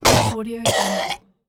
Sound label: choked